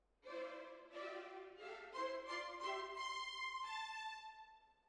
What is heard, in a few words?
Hall, Jazz for piano